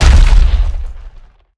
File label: Jumpland Walk Run, Behemoth; Evolve